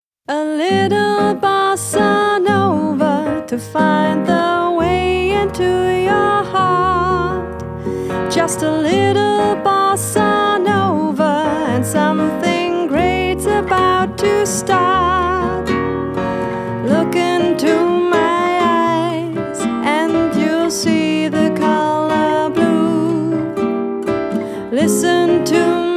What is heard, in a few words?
a little bossa nova